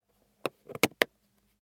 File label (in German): Mikrofonbox zu